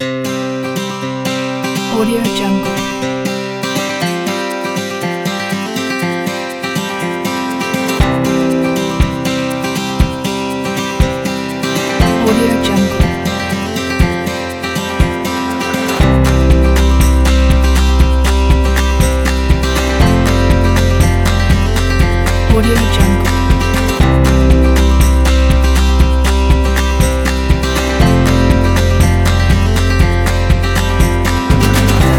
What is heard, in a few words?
country road